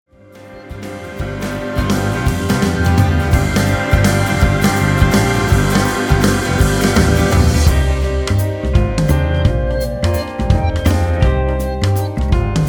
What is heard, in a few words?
Drums Percussion Organ Effects